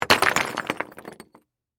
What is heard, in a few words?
Crash, Rock, Debris, Small, Dump; Rocks; Materials; Blast Wave